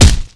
BEATTACK

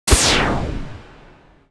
ATTACK JUMP

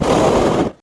GAS

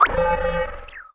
UFO JUMP

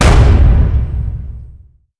drop iron

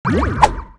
ATTACK

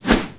attack engineer

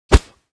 attack

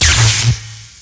TRAP SPARK

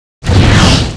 emoticon

